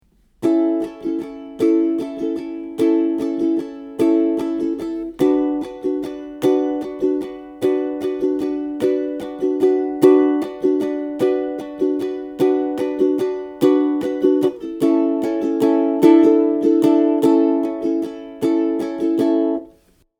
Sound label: Strum, ukulele